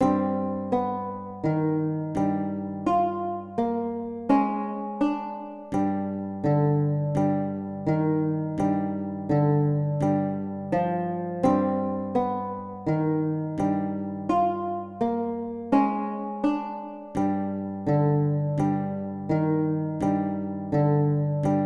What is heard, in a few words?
BLUES, lute